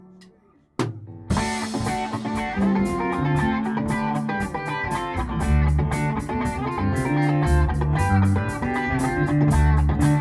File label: Funky Part